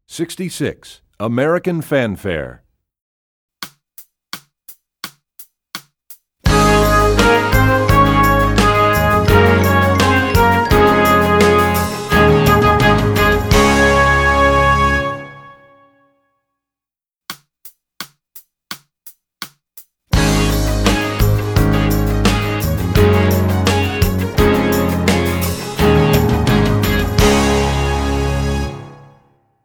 American Fanfare, Brass WW; EE2000 Book2

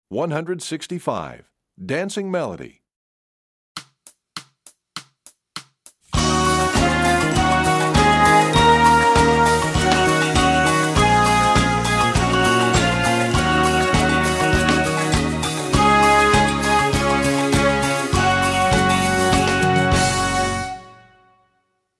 Dancing Melody; EE2000 Book1

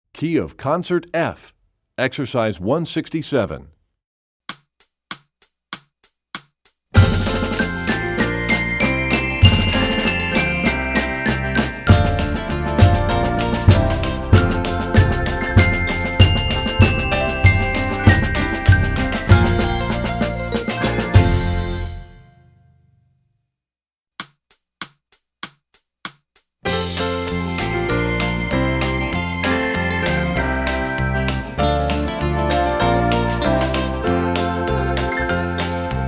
Studies Concert F, Percussion; Rubank; EE2000 Book2

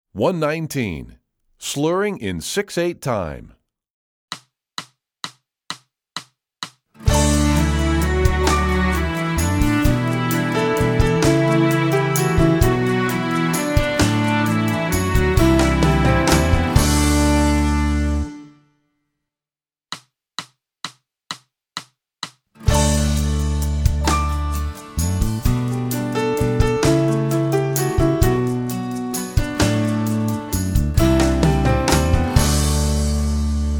Slurring In Six Eight Time, Strings; EE2000 Book2

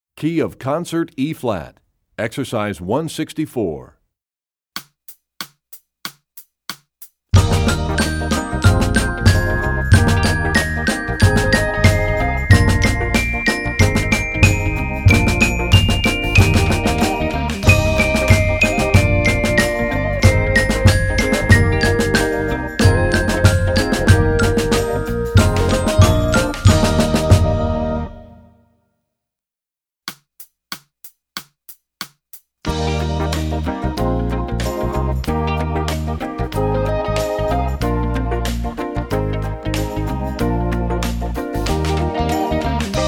Studies Concert Eb, Percussion; Rubank; EE2000 Book2

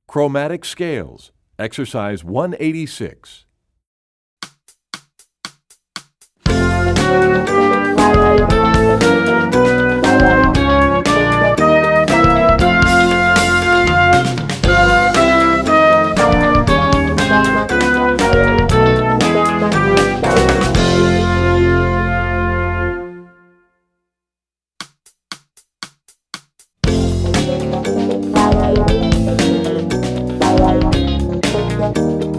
Chromatic Scale, Brass WW; EE2000 Book2; Rubank

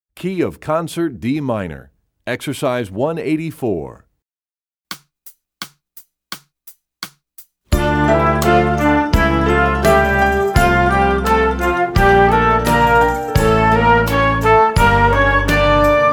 Studies Concert D Minor; EE2000 Book2; Rubank

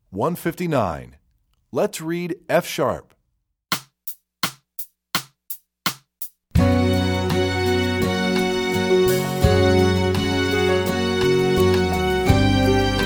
Lets Read F sharp, strings; EE2000 Book1

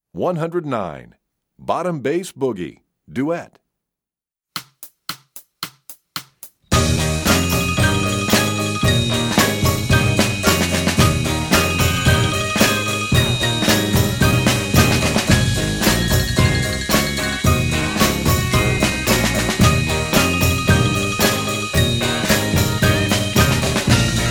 Percussion; Bottom Bass; EE2000 Book1

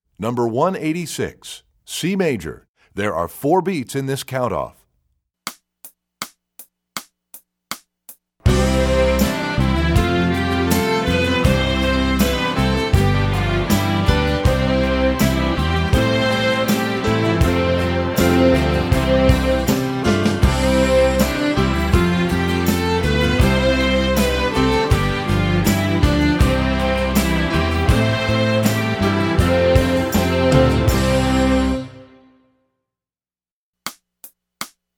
C Major, Strings; EE2000 Book1

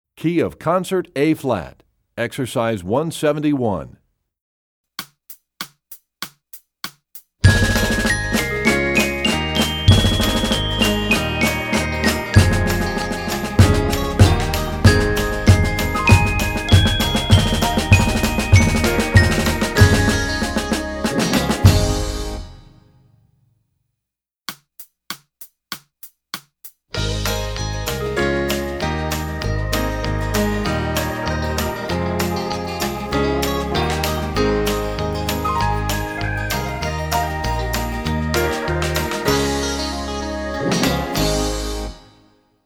Concert Ab, Percussion; Rubank Studies; EE2000 Book2

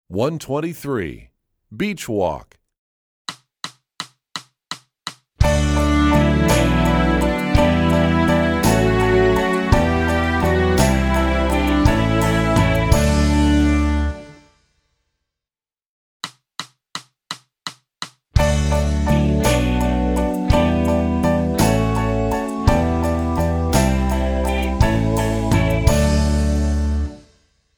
Beach Walk, Strings; EE2000 Book2

